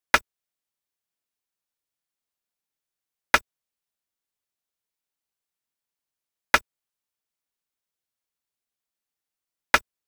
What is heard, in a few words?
Perc